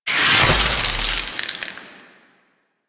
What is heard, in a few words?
Glaive Hover Start; Weapons; Warframe; Tenno; Teshin Glaive